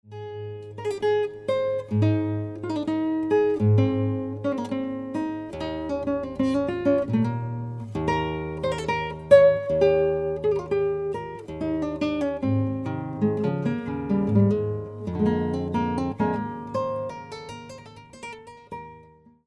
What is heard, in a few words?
MINUET